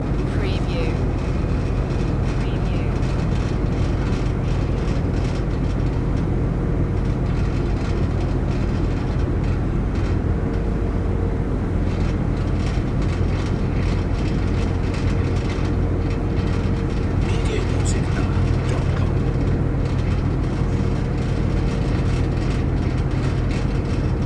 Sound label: ferry ride ambience loop